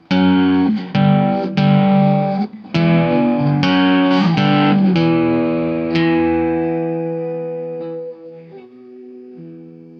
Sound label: halloween guitar